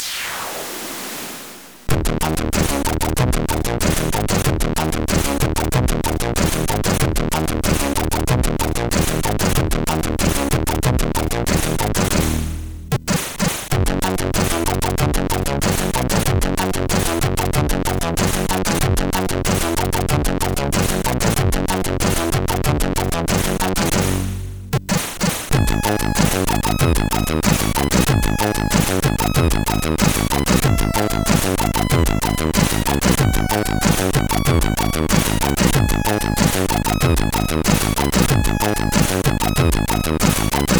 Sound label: Techno, MOS6581R4; hvsc